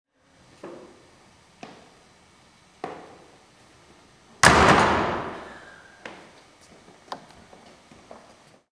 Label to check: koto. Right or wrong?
Wrong